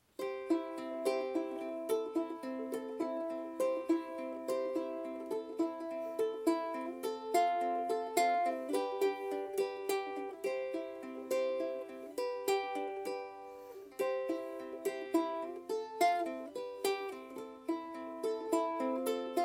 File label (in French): 8 corde